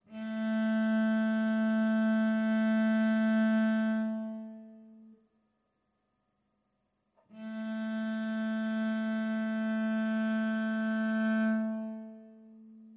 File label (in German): stimmtoene